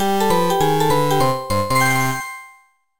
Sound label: Jingle DIG2 GOVER; Dig Dug: Digging Strike